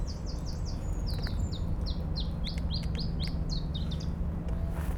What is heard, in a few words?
ibe song